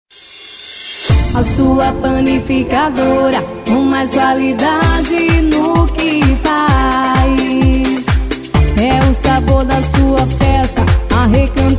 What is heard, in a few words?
JINGLE; PANIFIC. RECANTO DOCE